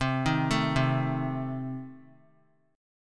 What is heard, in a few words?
C Minor 6th, chord; Cm6 arpeggiated; JGuitar